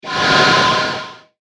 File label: Industrial Metal Sweep, whoosh sound effects